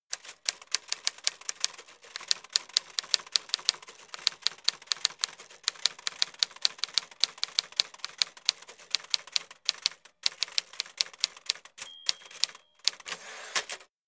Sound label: Typewriter